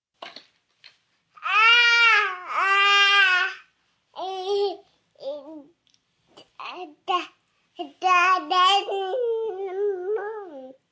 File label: Baby Babble, Interaction, baby cooing, playing with rattle& throwing toys, trying to communicate; Talking Sound Effects; very cute, speak, talk, kid, child, infant, human voice; VoiceBox; Twistedtracks